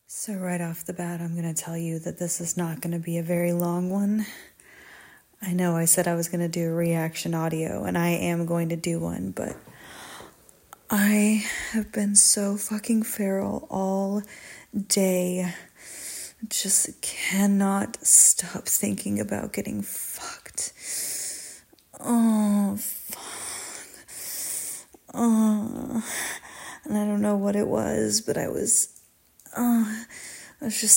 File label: Absolutely Feral, ramblefap, short, moaning, wet noises; [F4M][F4A]